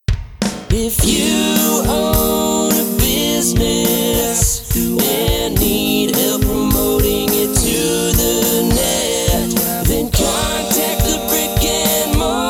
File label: vocals